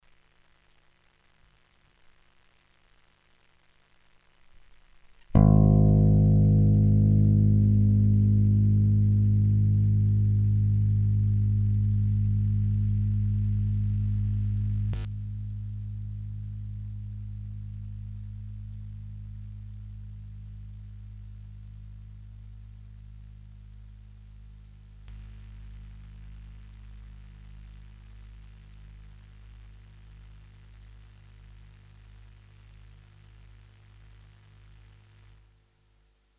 Coil off NOTE coil on then off again, guitar pickups